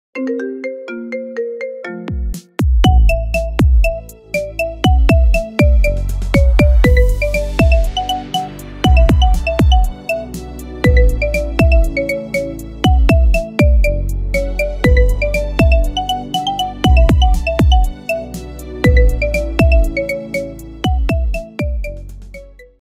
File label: Marimba Remix